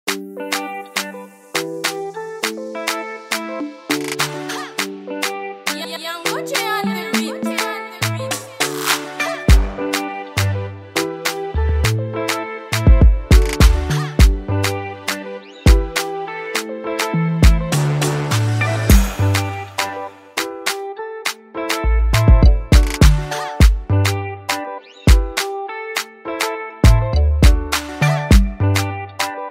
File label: Afrobeats